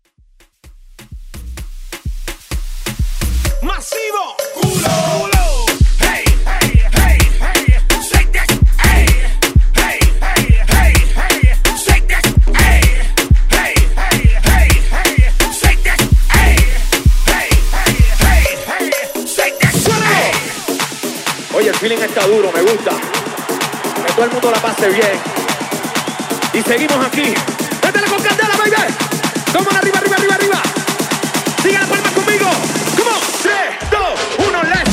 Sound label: Latin Hype Edit